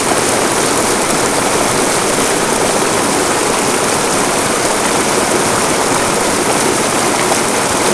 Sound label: waterfall; cstrike